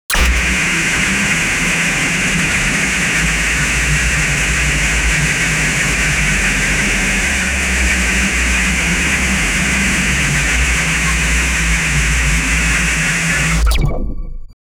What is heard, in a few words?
large machine laser power